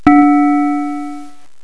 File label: BELL NOTE